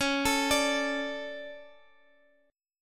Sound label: D 5th C sharp; D5/C#; arpeggiated; JGuitar